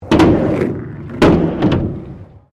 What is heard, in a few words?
SKATEBOARD HALFPIPE WOOD; Skateboard sounds; Skateboarding (Sound Effects:Sports)